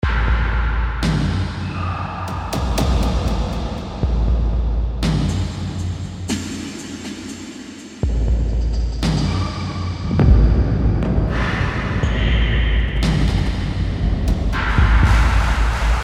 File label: transition fx